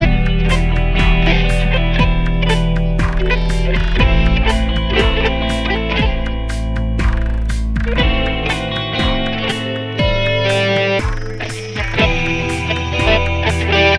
natural minor accompaniment

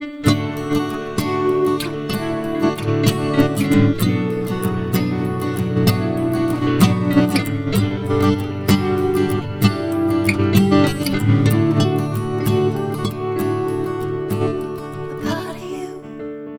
Seed Noise backing

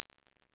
Imp Office, Reverb

